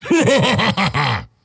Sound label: axe laugh; dota